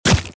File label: Meaty Deaths